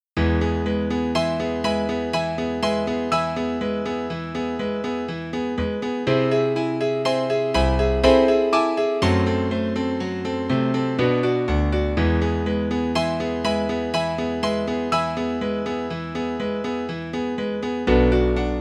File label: Lullaby; Earl Beat; Lullaby Stories